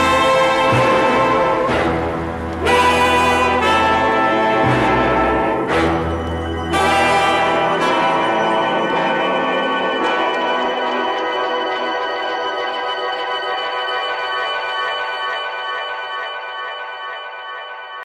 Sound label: light ringtone